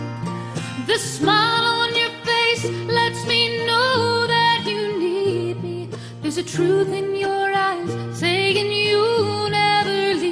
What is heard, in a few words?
English Ringtone